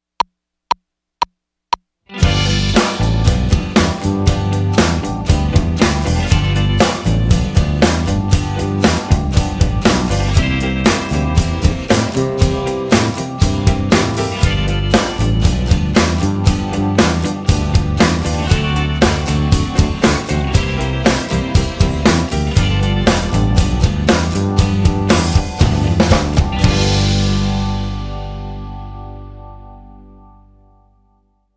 G Blues W/O Guitar

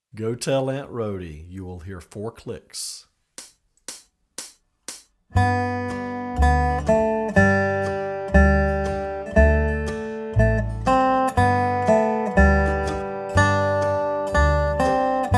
Go Tell Aunt Rody Fast

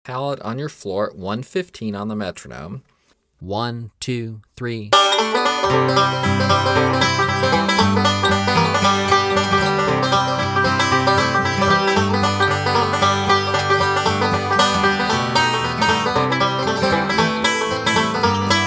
Pallet On Your Floor Breaks, 115 Bpm

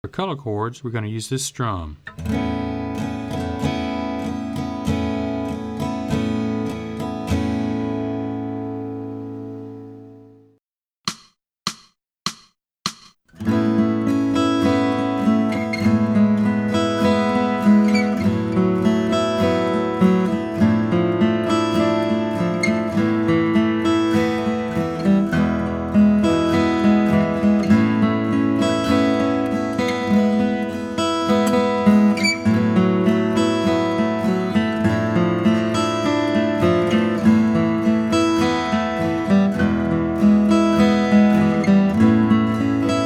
Color Chords Strumming

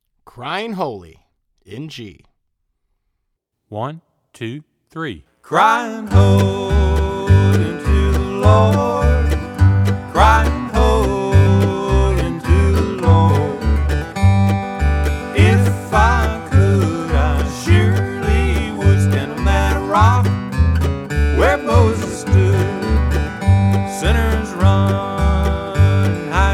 Cryin Holy G

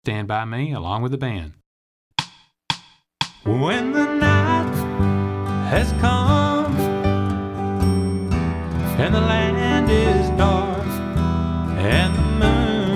Fast

